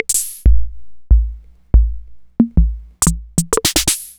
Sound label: Acetone Rhythm Ace; Sliced